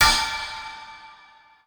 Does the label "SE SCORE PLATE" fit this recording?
Yes